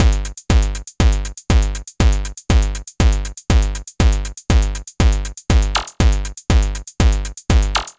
Hard Tech House

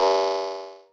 Moog Bass

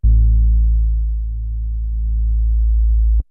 Low Sub

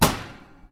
Heavy Metal Snare

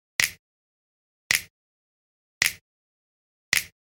Finger Snap Beat

